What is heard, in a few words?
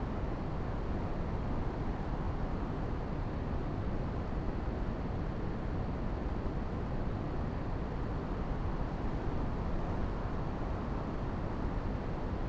night bridge ambience; theed; Star Wars Obi-Wan; XBOX